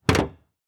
Transportation Truck Envoy Open Hood Exterior

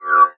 Multimedia Internet CD-Rom Flash Whoosh

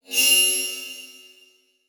Multimedia Internet CD-Rom Flash High Pitch

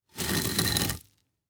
Impact Brick Drag Through Rock Salt Short; Impacts

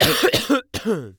Human Male Coughing

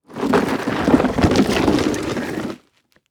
Impact Plastic Drag On Dirt Long; Impacts

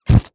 Cartoon Balloon Release Air Short; AdobeCC

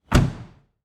Auto Pickup Close Bed Door; Transportation

